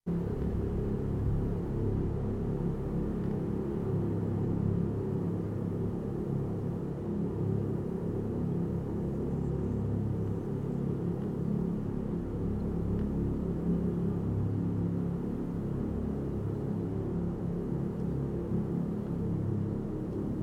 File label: PVC Pipe At Construction Site